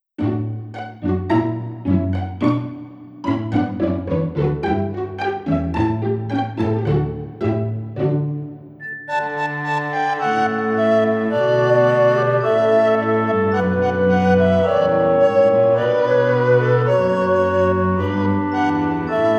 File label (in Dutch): Karaoke